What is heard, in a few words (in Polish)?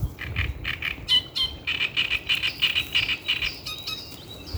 trzciniak